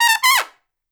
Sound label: Trumpet Noise